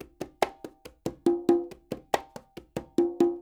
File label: NYC Percussionworks loops; Sampleheads